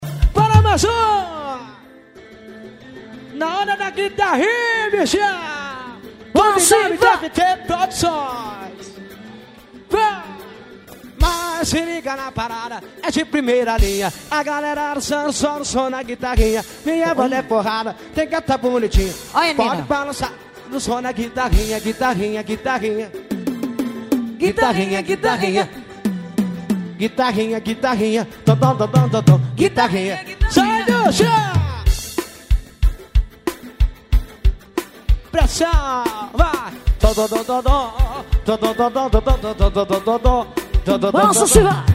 guitarrinha ao vivo

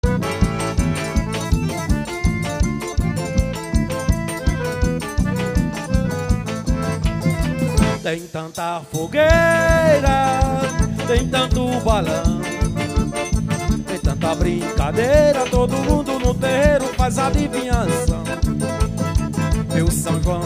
acordeone forro xote bom

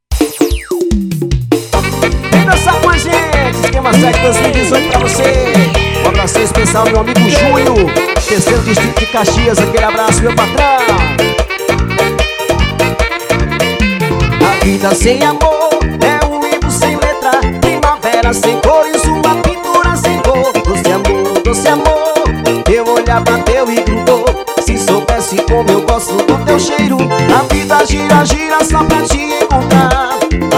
tocando todos os ritmos

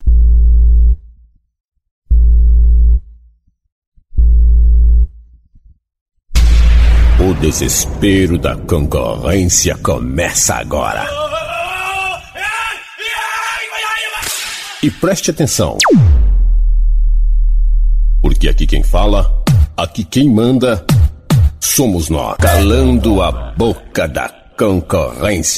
forro trilha

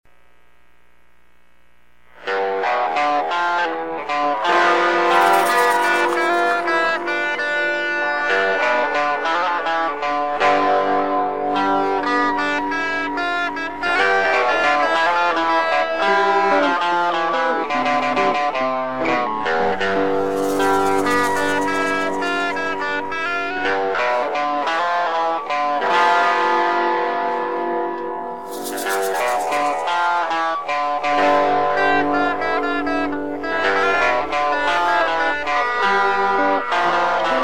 baiao improviso; improviso no 5 grau

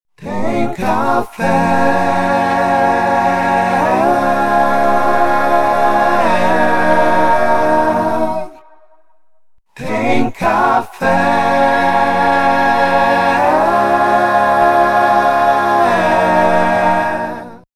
interludio

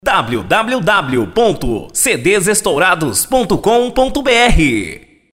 musica extra

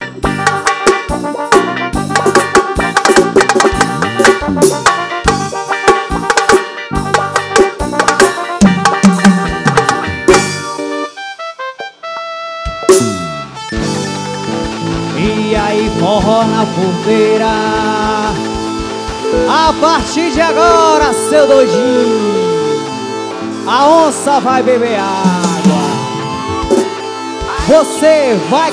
abertura

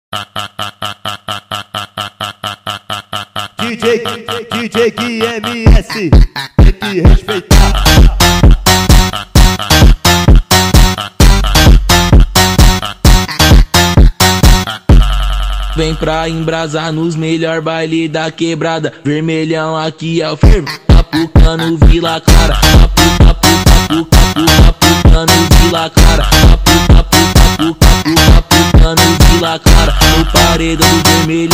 automotivo medley

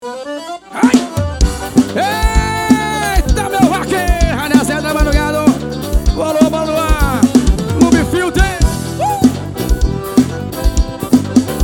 forro seis cordas

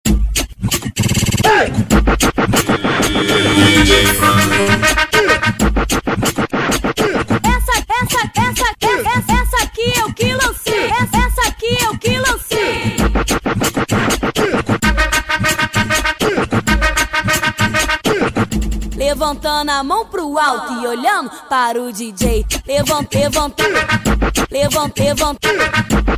funk

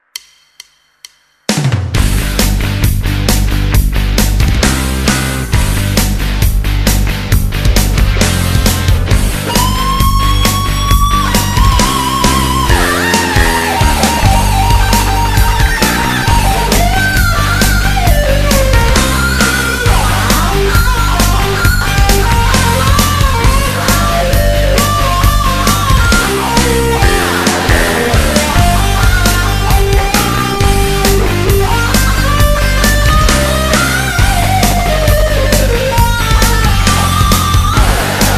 guitar brincando de improvisar

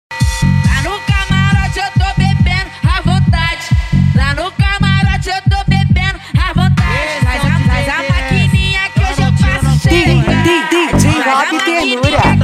mega funk

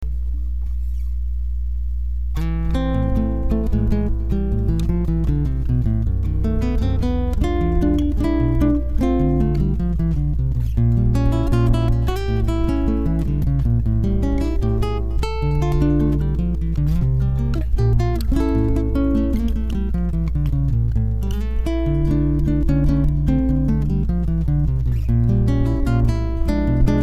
violao

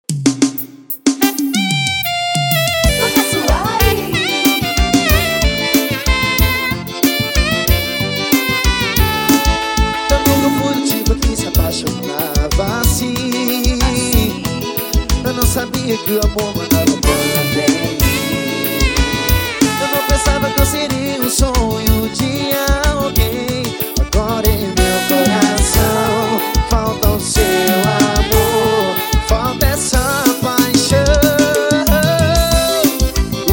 medley xote retro

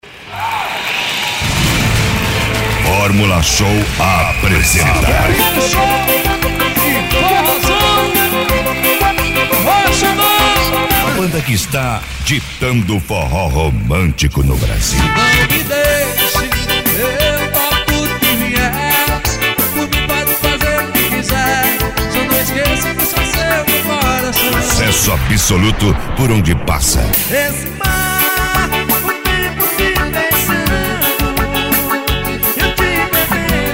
abertura do cd forro

